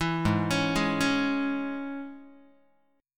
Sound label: G sharp Suspended 4th Sharp 5th G sharp, Guitar; G#sus4#5; arpeggiated; JGuitar